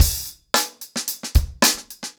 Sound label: 110BPM, acoustic drums; The Roots Drumloops